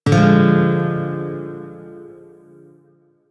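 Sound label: Shawzin Minor Chord B, Music; DE; Warframe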